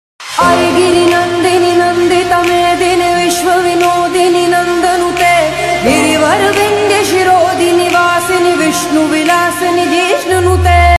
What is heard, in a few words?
durga puja ringtone bgm; दुर्गा पूजा